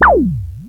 reverse; Quentin C's Gameroom

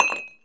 Coffee Cup Drop; SFX Kit; Foley - Misc

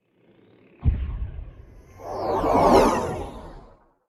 sea torpedo; Blind Drive

